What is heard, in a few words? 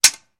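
Sword Clanks Single; swords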